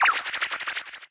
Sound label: Bat deploy; 部署音效 dep 蝙蝠生成音效; 莫提斯; 荒野乱斗